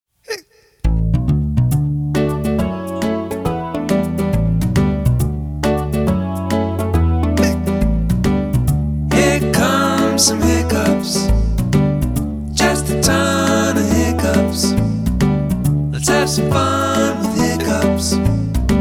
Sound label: Hiccups